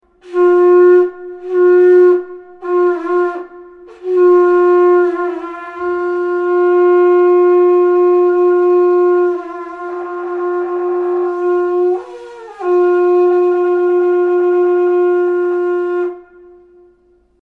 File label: horn